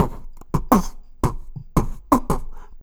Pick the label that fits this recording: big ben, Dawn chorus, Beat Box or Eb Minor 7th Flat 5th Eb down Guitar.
Beat Box